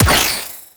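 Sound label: Sentient Sniper Projectile Explode; Warframe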